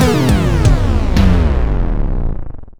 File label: Song Over Sting; Hiragana Pixel Party